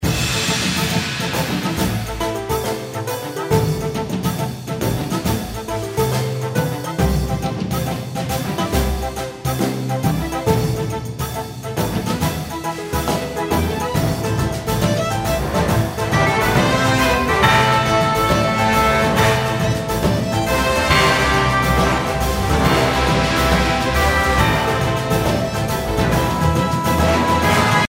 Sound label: music; Highland Reels